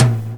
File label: TOM TOM; E-Lab; X Static Goldmine 2; akai